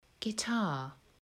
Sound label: guitar; fun with sounds